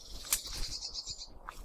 mesange bleue

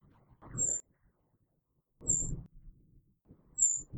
Oiseau

